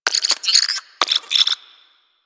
Guild Computer Beeps; Ambience; Warframe

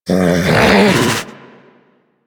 Snow Critter Vocal Alerted; Warframe; Hunting Critters

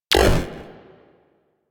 UI Corpus Theme Error; DE; Warframe

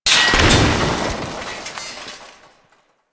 fire extinguisher destroy

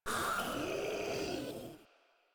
Infested Ancient Vocal Pain; Warframe; Proto Infested Ancient

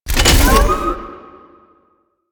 Drone Fabricator Spawn Start; Warframe; The New War; Veso; Quests

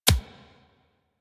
Bullet Impact Hit Notification; Warframe; Weapons

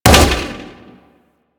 Grenade Bounce; Warframe; Weapons; Corpus

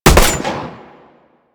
Fire Mech, Weapons; Strun

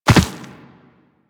Land Soft Dirt, Footsteps; Warframe; Operator

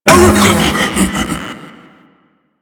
Ape Prelate Pain E; Enemies; Warframe; Proto Infested

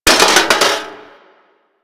Metal Damage, Impacts; Warframe

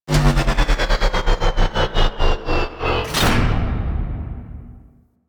Ship Shield Orb Disable; Warframe; Corpus Ship

